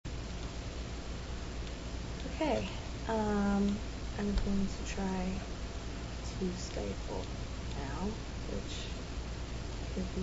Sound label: stifles, female